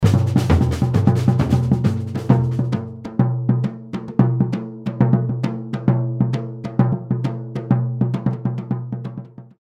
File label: Surdo